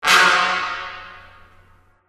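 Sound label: Sting Small Brass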